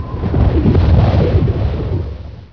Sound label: magic